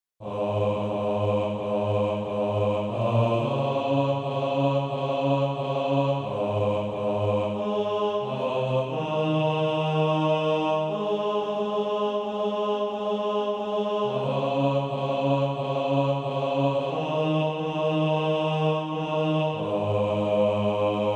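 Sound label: bass, SATB practise music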